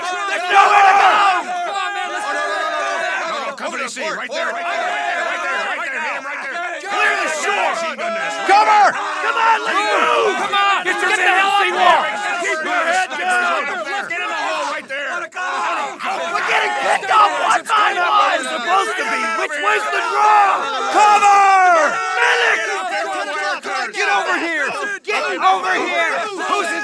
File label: amb guys rush; mohaa